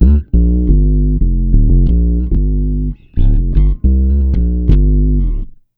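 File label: G minor, Bass, 90 BPM; Sampleheads; Ultra Freakin' Bass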